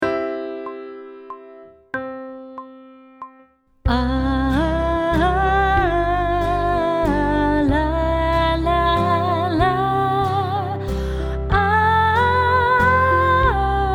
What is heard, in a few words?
Sight Singing (with vocal); pop vocals; grade 3